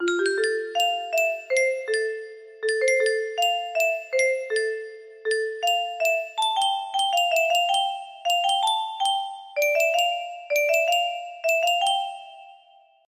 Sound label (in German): orgel